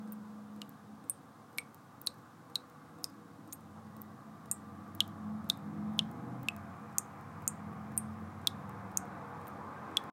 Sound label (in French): GOUTTES D'EAU